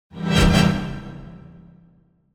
Symphonic Hit Fast Double Final Chords - Higher (brass); Hollywood Edge; sound designer's toolkit v2